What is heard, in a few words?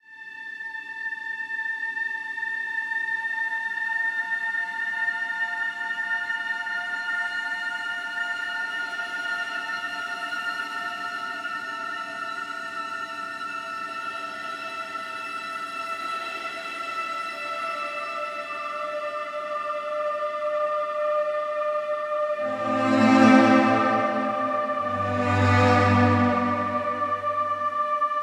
Grass Music